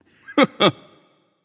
Heh Heh; smite; anubis

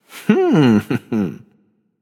hehheh; smite; apollo

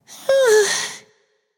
ugh, voicepack; smite; jorm

